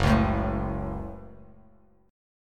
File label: F sharp Minor F sharp, Bass Standard; strummed; JGuitar